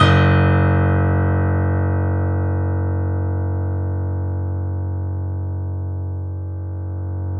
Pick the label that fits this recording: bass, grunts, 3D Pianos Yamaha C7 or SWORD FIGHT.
3D Pianos Yamaha C7